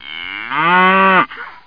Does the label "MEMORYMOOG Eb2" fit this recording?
No